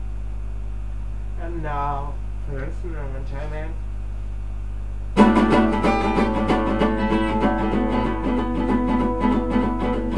Acoustic

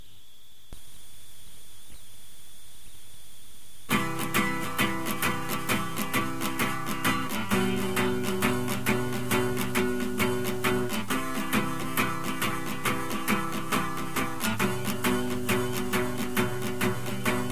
Tuba

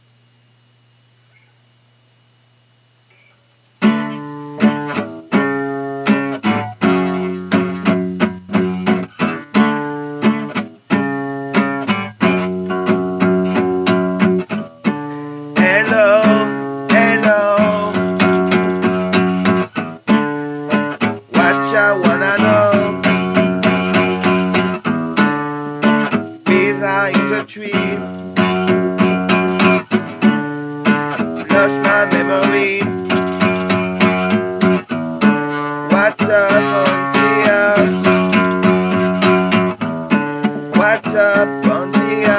Live Acoustic Session

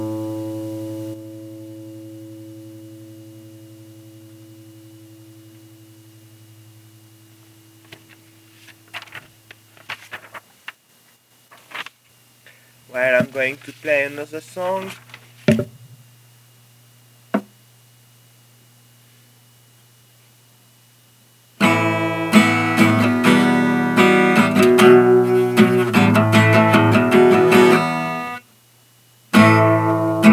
Live Acoustic Session Interlude

